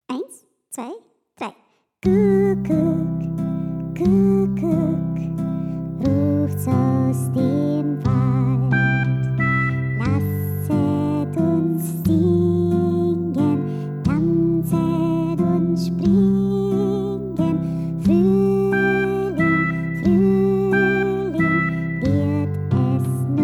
kuckuck 90

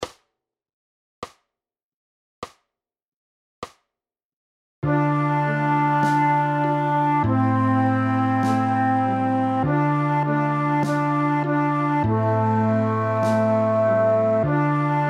Hejo playalong

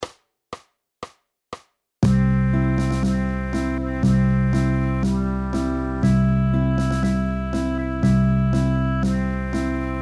hoch sollst du leben playalong

